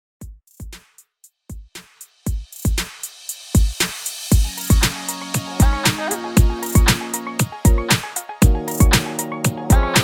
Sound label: Bootymix 117 BPM